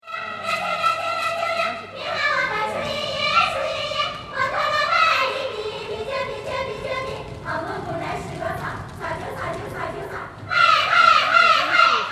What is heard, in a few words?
Traditional Chinese Singing